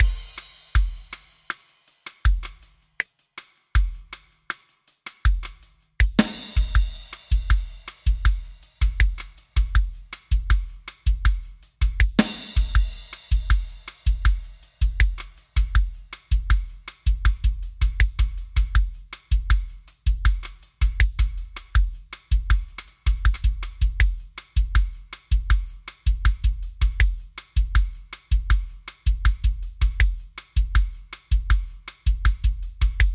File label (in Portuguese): Amostra pagode